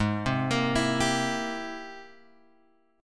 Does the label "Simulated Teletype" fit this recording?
No